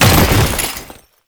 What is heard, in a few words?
Body Fall Default; Enemies; Warframe; Tau Dax